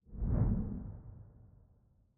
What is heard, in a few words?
Pipe Exit